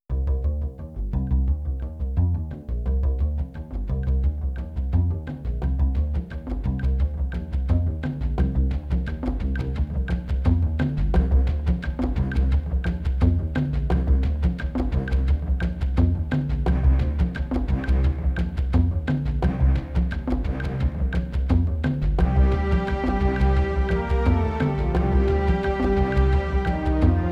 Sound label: Alert; Gamera 2000